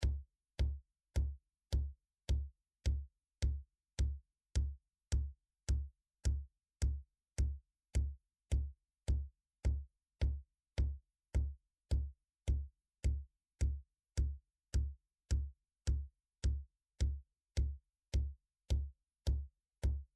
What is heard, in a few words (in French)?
Kick